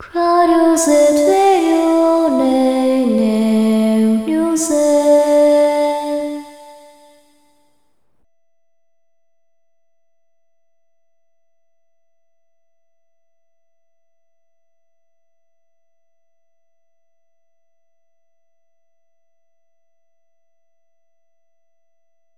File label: Fonic Hymn; Tales of the Abyss; PS2